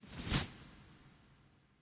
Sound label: cloth; smite